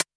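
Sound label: Electro Kit Closed Hat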